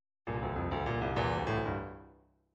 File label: midi fills rockfunk